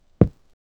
giants footstep small earthquake

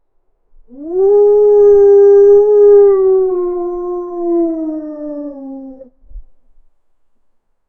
loud howling of wolves

